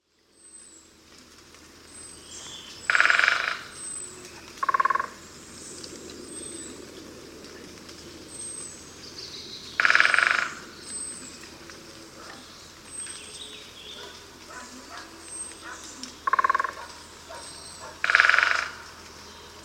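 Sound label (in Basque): okil handia, Pico picapinos, Dendrocopos major, Canto; Observatorio de aves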